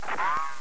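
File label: ricochet; 12000 Graphics Pack - A Complete Graphics Collection For Your PC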